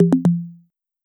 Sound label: voice disconnect